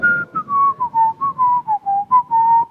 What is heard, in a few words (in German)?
pfiff